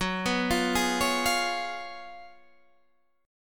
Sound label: Gb Major 7th Suspended 2nd Suspended 4th, Guitar Standard; GbM7sus2sus4 arpeggiated; JGuitar